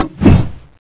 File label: stab miss, weapons